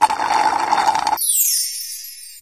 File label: Healing; Superbrawl Healing Ui; Nickelodeon Super Brawl Universe; Android